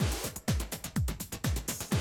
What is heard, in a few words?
125, Classic Drum Loops